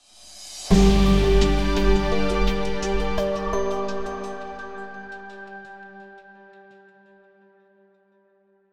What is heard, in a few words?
fanfare solving; Opus Magnum